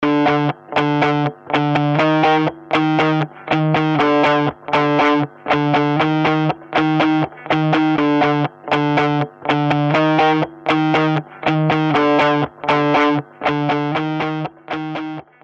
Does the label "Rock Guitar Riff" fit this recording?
Yes